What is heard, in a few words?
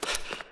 Run